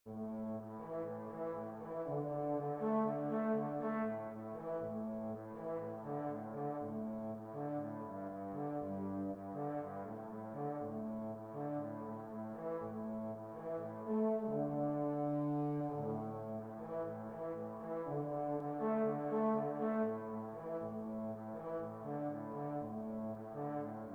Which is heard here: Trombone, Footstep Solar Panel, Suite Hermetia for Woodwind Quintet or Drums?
Trombone